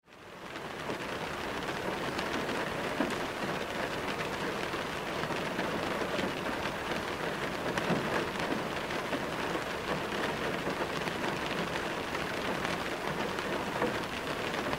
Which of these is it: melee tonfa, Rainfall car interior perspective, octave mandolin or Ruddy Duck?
Rainfall car interior perspective